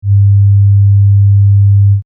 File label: oscill